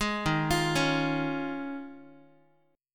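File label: E flat 9th Suspended 4th, Guitar; arpeggiated; chord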